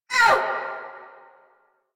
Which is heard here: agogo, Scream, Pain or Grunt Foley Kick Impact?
Pain